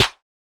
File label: Snares